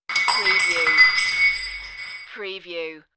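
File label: Bowl Break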